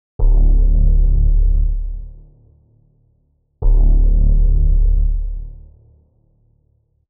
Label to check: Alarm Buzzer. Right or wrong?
Wrong